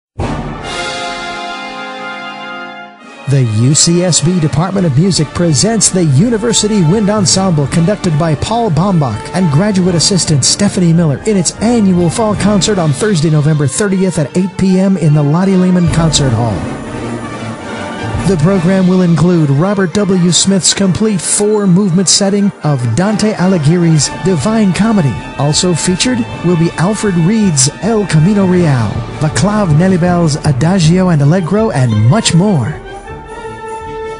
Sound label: Wind Ensemble